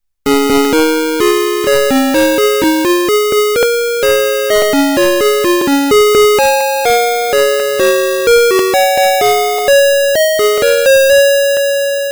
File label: Happy birthday day